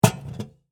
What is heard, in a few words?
Lantern Set Down; Foley; Set Downs (Sound Effects:Objects)